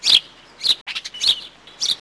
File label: sparow